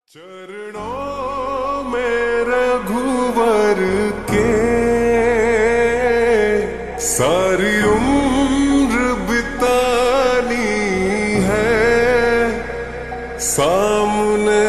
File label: Slowed Reverb Ringtone